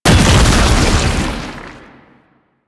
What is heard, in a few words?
Tank Infested Charge Wall Slam; Enemies; Abilities; Warframe; Lasrian